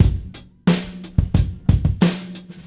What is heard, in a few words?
119 bpm drum loop sample f sharp key